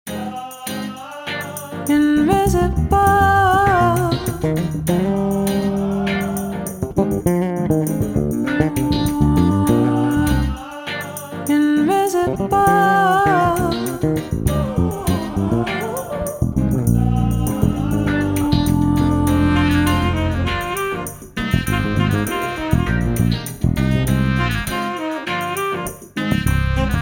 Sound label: VOX WITH FILLS